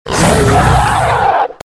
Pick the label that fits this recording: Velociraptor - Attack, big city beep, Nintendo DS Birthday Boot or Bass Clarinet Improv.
Velociraptor - Attack